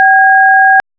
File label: dtmf B